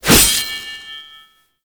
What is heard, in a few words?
Hatchet Whoosh; Warframe; Corpus; Venus Hatchet